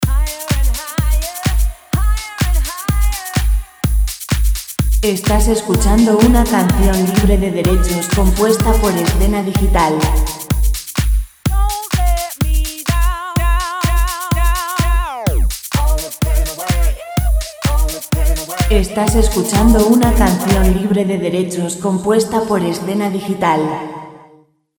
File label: 126 vocal; Música vocal sin copyright para audioguías